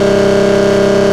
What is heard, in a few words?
High Nissan Z350; car simulator 2